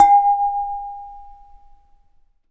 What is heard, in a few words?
Bedroom, Lampshades